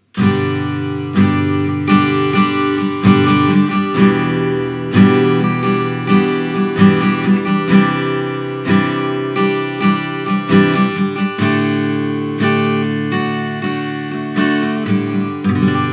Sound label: STRUM